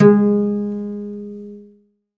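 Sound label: SOLO CELLO, Synclavier Strings; ILIO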